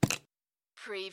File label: Pop open plastic lid from spray can